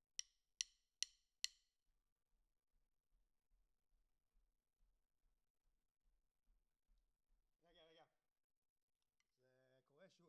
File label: Snare Top